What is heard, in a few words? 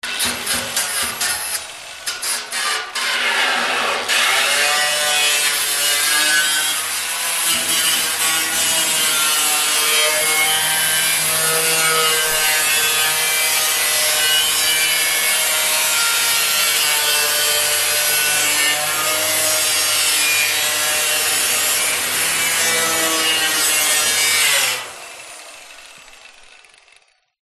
industrial angle grinder long